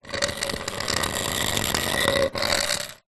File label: metal pole solid drag scrape concrete; foley; zapsplat